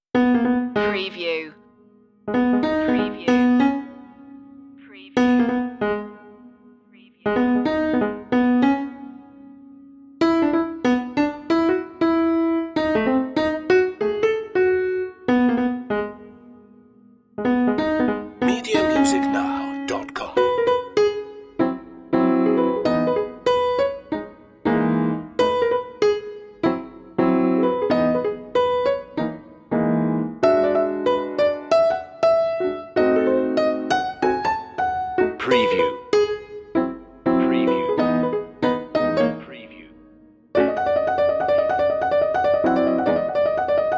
tip tap piano only

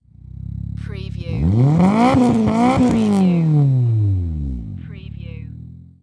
MITSUBISHI ECLIPSE EXHAUST DOUBLE REV

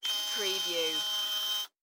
Security Camera Servo; sound effects bible

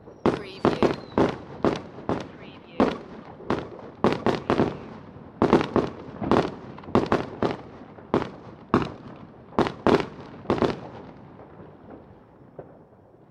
Fireworks - Bangs and a whistle; beanstalk audio

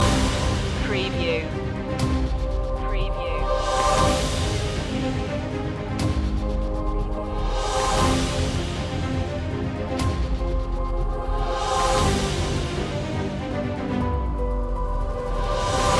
last countdown loop

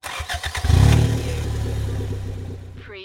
Car Motor Start Up Engine Perspective

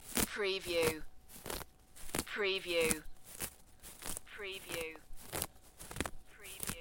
Rip Grass From Ground

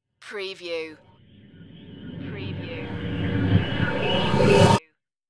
ghost glitch riser

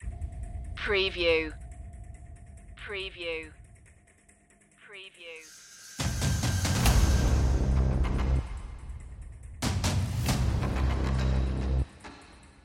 apocalypse perc mix

